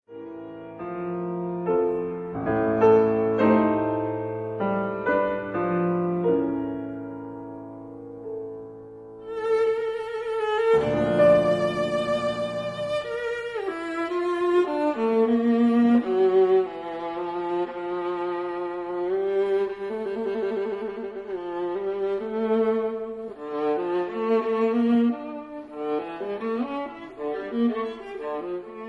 Sonata for violin piano in A major Recitative Fantasia ben moderato